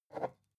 glass pick up smooth soft; objekte

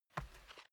step barefoot wood plank sneak single right; schritte

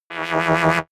sci-fi scanner wobble medium; energie

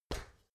step ladder metal single

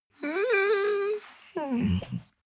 dog whine low sigh; natur-tiere; hunde

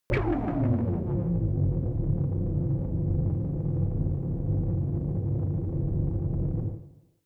space shuttle engine start idle; raumschiff

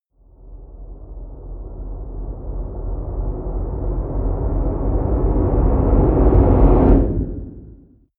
deep noise rumble hard, risers; whooshes rises

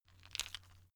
stone gravel clatter soft; natur-tiere; steine

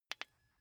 car key button click signal fast

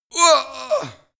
male death short; schreie; menschen; soundpool

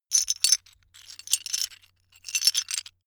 metal pieces clatter

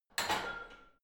barbell heavy plates lift rack, fitnessstudio

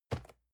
cardboard box medium place soft; objekte

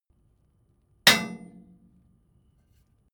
metal impact bright short hard

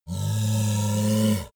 yeti growl warning; kreaturen

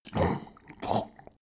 dog drink snort; natur-tiere; hunde

